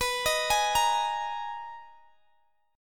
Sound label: Eb Suspended 4th B; Ebsus4/B; arpeggiated